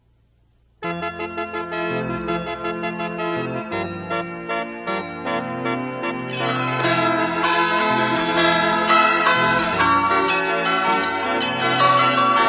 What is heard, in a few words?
DX7 (Horn-Chime), P600 (strings), AHarp